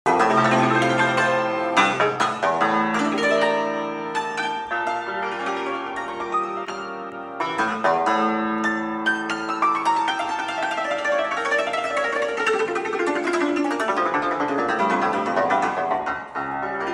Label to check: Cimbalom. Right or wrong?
Right